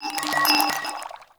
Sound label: Vial Foley